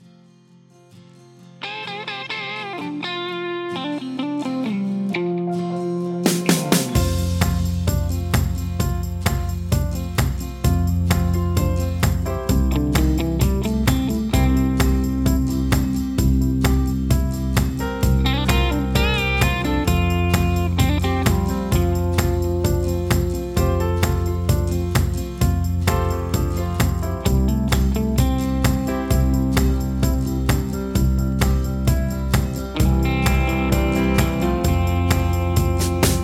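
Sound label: Instrumental with background vocals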